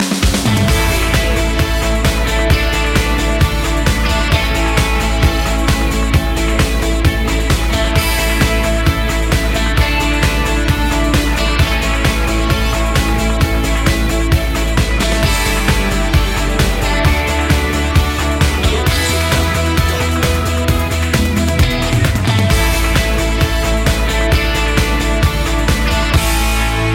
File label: victory day, royalty free music rock moderate